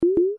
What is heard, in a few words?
shutter video; Amoi Skypephone WP-S1